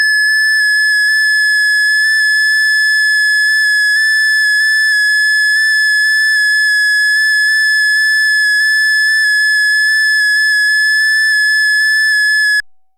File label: JS8 Call, digital modes; hamradio; Digimodes